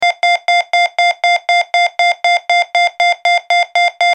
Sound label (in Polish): ton